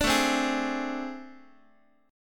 A Minor Major 7th Double Flat 5th, Guitar; AmM7bb5 strummed; x,x,5,7,4,7; 4A,4E,5A,5D,5G#,6C#

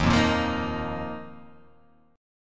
D sharp Suspended 2nd

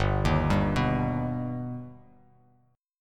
G Augmented; G+ arpeggiated; JGuitar

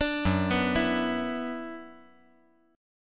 Gb 7th Suspended 4th Sharp 5th Gb

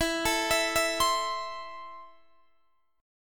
E Suspended 4th Sharp 5th E, Guitar, Open D; x,19,19,22,19,22; down

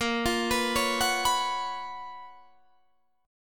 Gb Major 11th

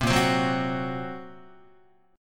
A sharp Suspended 2nd Sharp 5th A sharp, Guitar; strummed; JGuitar

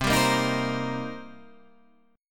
C Minor 7th, Guitar, Half Step Down

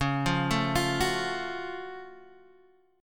F sharp Minor Major 7th

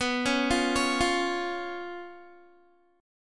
B Suspended 2nd Flat 5th, Guitar; Bsus2b5; arpeggiated

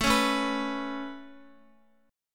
A Major Add 9th, Guitar; Aadd9 strummed; x,x,9,6,9,7; 4F,4A,5C,5G,5C,6E

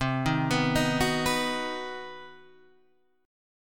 C Minor Major 7th Flat 5th chord; CmM7b5 Chord {8 6 9 8 7 7} arpeggiated

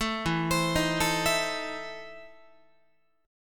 E Major 7th Suspended 4th Sharp 5th, Guitar; EM7sus4#5 arpeggiated

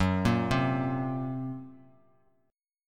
F sharp Major Flat 5th, Bass Standard; arpeggiated; x,9,8,5

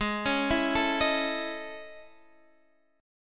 A Major Sharp 11th, G sharp

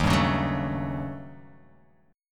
Eb 7th Sharp 9th Flat 5th Eb, Guitar Open F; strummed; 3,2,1,1,0,x

